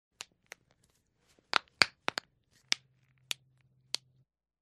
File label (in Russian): po ocheredi hrustjat vsemi palcmi